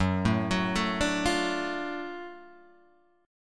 Gb Minor Major 7th Sharp 5th Gb down Guitar Open G